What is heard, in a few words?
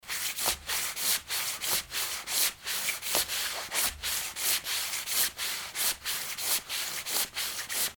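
sound of rustling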